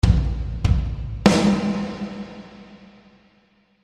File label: Drums; Dragon Ball Z